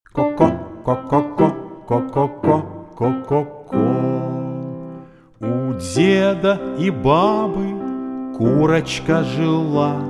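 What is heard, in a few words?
speech therapy vocal warm-up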